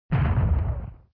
boom

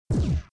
plasma pulse

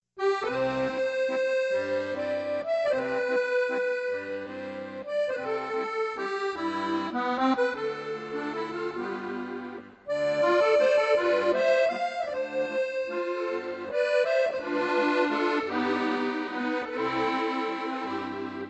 song

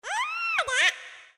aaaaaah

